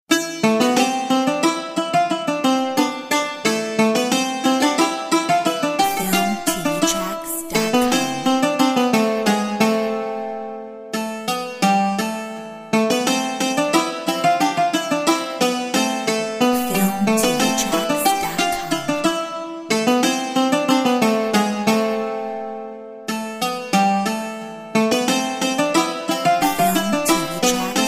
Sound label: Irish greek bouzouki